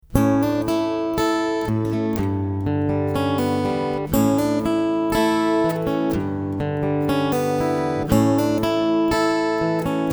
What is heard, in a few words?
late night jam